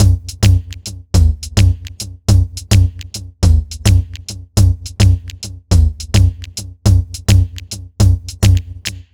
105 Jungle, Breaks; loops; 105 BPM; Loopmasters; Jon Carter & Alex Blanco; Block Party Essentials

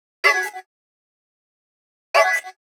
126 F# VOCAL LOOP, Funky Underground; Loopmasters; Funky Underground Vol 2

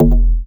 Bass Shot D#

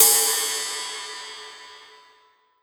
Ride, Cymbals; Mutekki Media; Vengeance Electroshock Vol.2

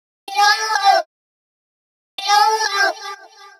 126 G# VOCAL LOOP, Funky Underground; Loopmasters; Funky Underground Vol 2

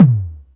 Toms

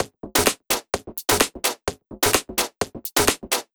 Loop, 128 BPM

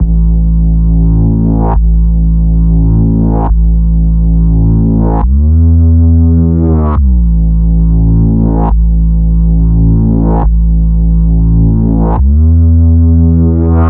Bpm138 Bass Loop Am; Onyx; Prime Loops; Dubstep Illusions

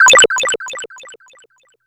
Trickle Down; Fx; Loopmasters; Jon Carter & Alex Blanco; Block Party Essentials

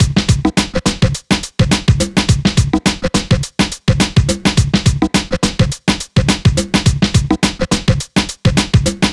105 Bingo Bongo, Breaks; loops; 105 BPM; Loopmasters; Jon Carter & Alex Blanco; Block Party Essentials

